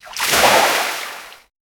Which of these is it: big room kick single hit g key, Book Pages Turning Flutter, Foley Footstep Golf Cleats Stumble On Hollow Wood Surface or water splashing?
water splashing